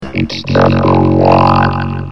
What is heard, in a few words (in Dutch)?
Kermis jingle; Kermis Jingles Diversen